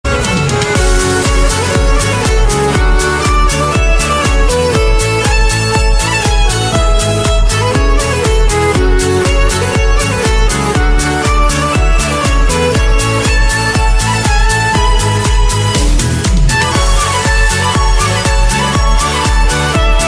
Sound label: emotional violin; classical